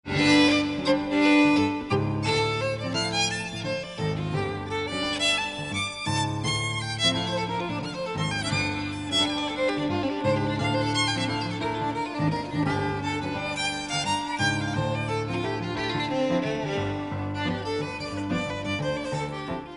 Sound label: Danska Polska solo; NRK 1978